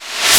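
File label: reverse noise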